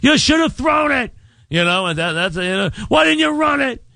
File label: Scream; WFAN